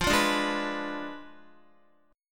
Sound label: F Diminished 7th, Guitar; x,8,5,7,8,7; Fdim7 strummed; JGuitar